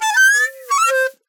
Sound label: Pungi Fail; tintin reporter cigars of the pharaoh